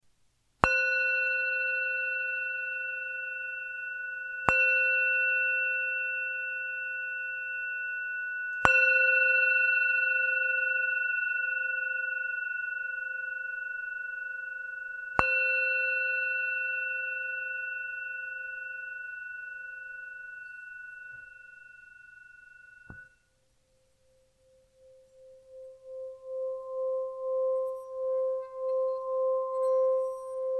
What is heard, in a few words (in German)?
klangschale 355g; ishana